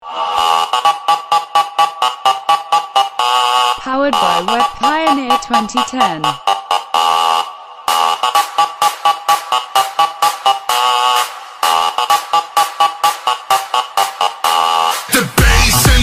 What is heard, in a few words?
Hard Bass Boost